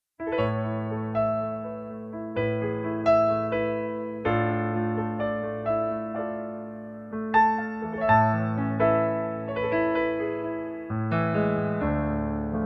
Pianoplayback in A Dur

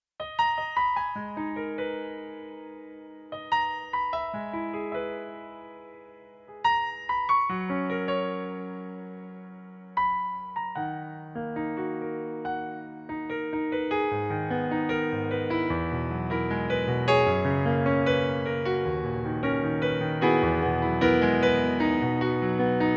Playback gis-moll

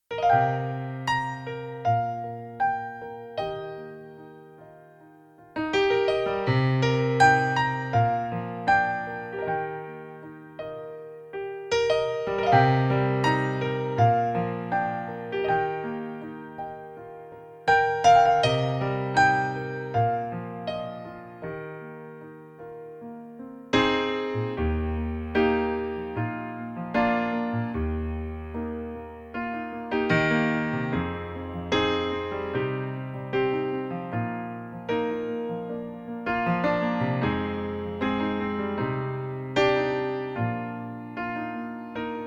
piano playback fuer ihn Eb; pianoversion

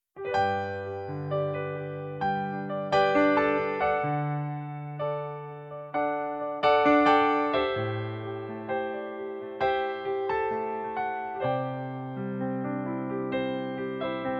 Pianoplayback G Dur langsamer

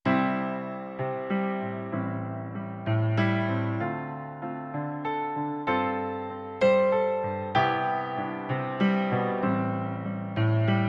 vollinstrumentiertes Playback

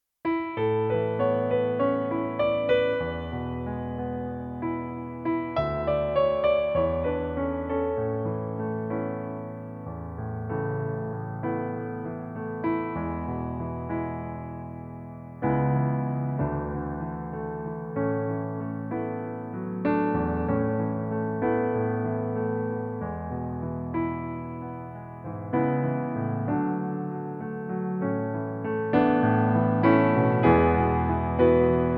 pianoplayback A Dur; pianoversion

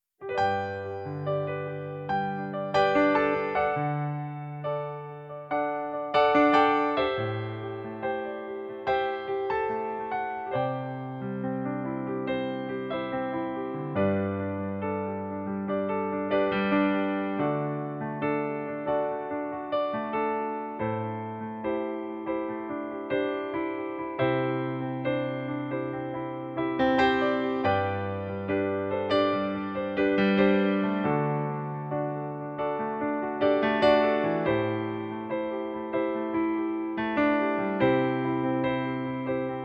Pianoplayback G Dur

